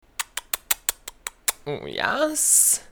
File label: uuuh yaasss; Uuuhhh YAAASSS